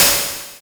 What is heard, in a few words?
Yamaha TX81Z FM Hi Hats